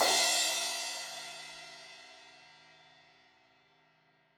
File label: Crash 16in edge, Cymbals; Deeply Sampled Drums